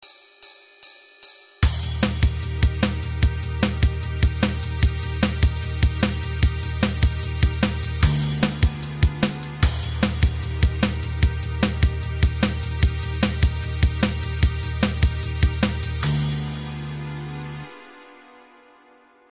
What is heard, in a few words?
tap adagio 150, tapping